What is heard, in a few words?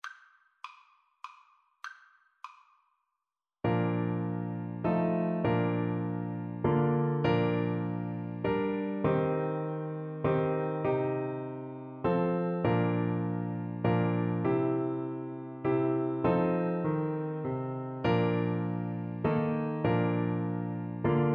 Clarinet